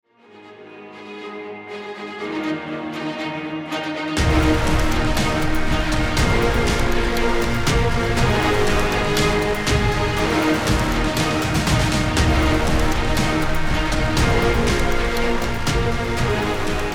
Strings Brass mix

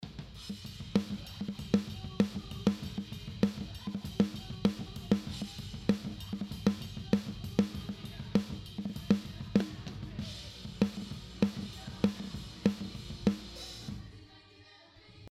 Snare Bypass; Lewitt Mix Contest

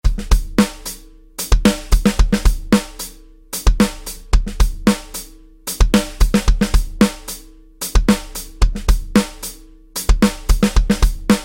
Taye with Sticks; BFD

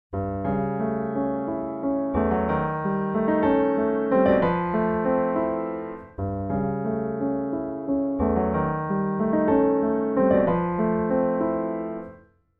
soft pedal

